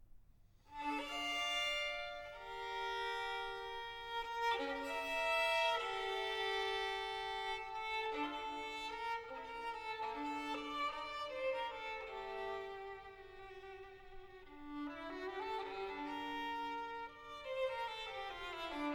partita in d, sarabande; bwv 1004

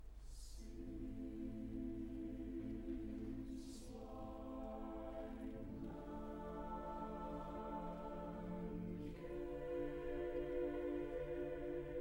chorus

